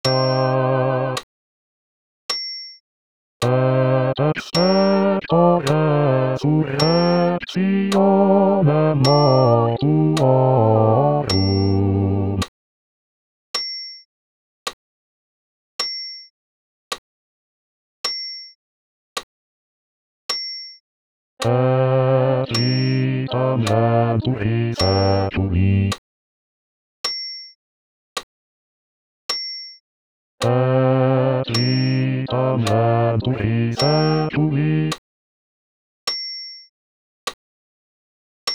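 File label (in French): Credo basse voix accompagnement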